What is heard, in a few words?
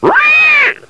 boar